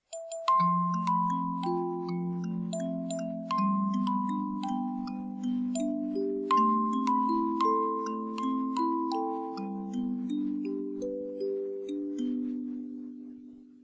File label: Music Box; corpse party book shadows